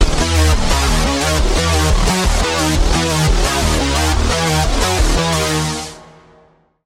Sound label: Lead; Routed Sound; Spire Expansion - Quantum Enforcer Vol.1